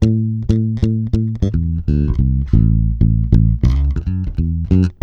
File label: FUNKY WEST A, Bass, 96 BPM; Ultra Freakin' Bass; Sampleheads